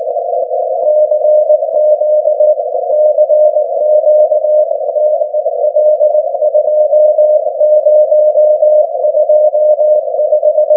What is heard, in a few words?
CW 473 kHz